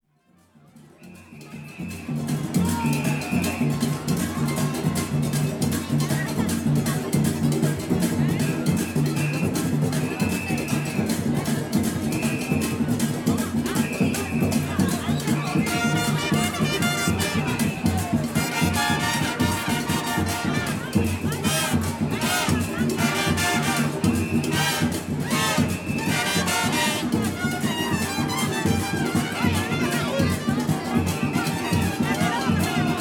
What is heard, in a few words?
Carnival Music, Cuba; Latin-American Music